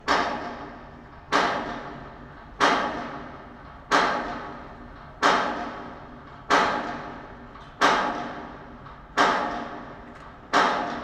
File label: Solid Steel Hammering Loop